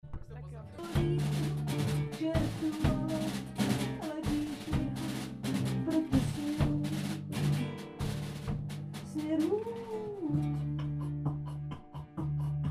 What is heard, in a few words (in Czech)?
beatbox+bordel